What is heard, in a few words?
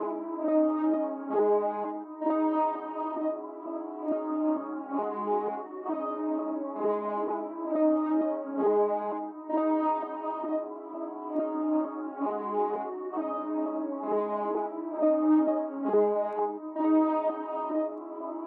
132 BPM C# Min Synth; Melody; Reverse; Loop Stems; Cymatics; 2022 Melody Collection; Blessings